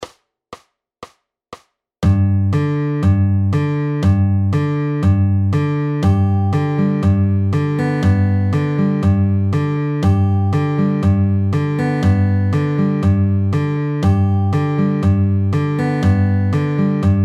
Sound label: FAST, fingerpicking